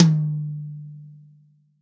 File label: TOM, Real Drum Kit, POP KIT